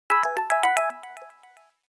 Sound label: A toy watch, alarms; Samsung Galaxy-S-Fascinate-SCH-i500